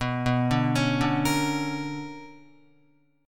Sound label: B Minor Major 9th, Guitar; BmM9 arpeggiated; JGuitar